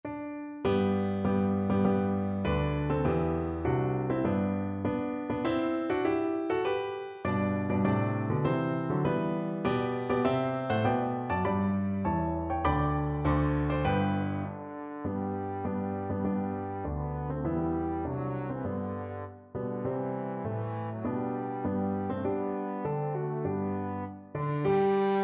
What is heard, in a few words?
trombone